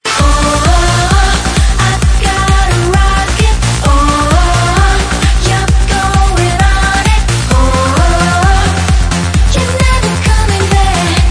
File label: rocket